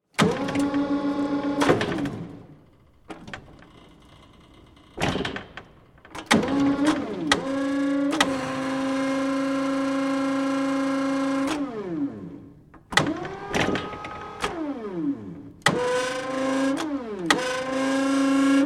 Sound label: Industry Hi Lo Activity, factory; SFX Bible